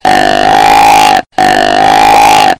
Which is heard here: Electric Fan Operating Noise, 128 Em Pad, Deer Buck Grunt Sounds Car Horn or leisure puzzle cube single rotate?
Deer Buck Grunt Sounds Car Horn